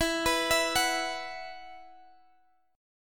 F sharp 7th Suspended 4th E

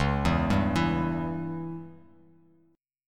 C sharp Diminished C sharp, Bass Standard; arpeggiated; chord; 9,7,5,9; JGuitar

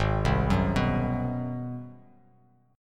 G sharp 7th Sharp 9th, Bass Standard; arpeggiated; JGuitar

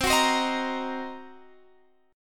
C Minor 7th Flat 5th Guitar Drop D, chord

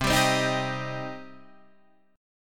C Minor C Guitar Half Step Down

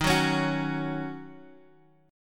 D sharp Minor 7th; strummed; JGuitar

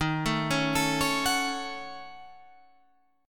D sharp Minor 7th Flat 5th

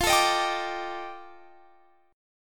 F 13th, Guitar Standard; F13 strummed

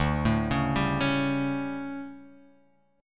D Major 7th Suspended 4th, chord

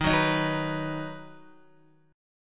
Eb Minor Sharp 5th, Guitar, Ebm#5 chord; Ebm#5 strummed; JGuitar

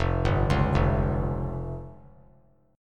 F Suspended 2nd Sharp 5th F down; Fsus2#5 arpeggiated; JGuitar

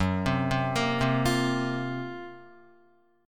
F sharp Major 7th Flat 5th, Guitar; arpeggiated; JGuitar

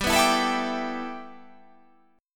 G 6th Add 9th

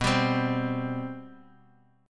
C 5th B; C5/B strummed

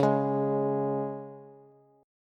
Db 5th Db down Bass; Db5 strummed; JGuitar

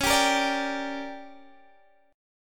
D Major 7th Suspended 4th Sharp 5th

